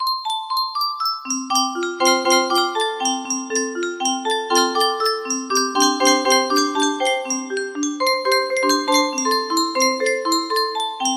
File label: higher octave, music box melody